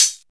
low treble hat one shot f sharp, Royality free pedal hi-hat tuned to the F# note; hat samples